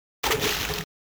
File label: splash